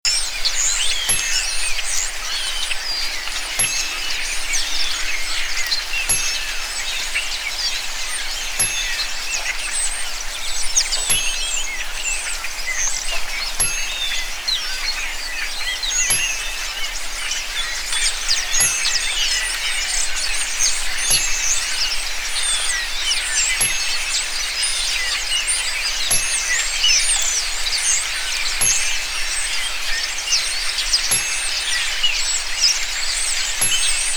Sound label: Rainforest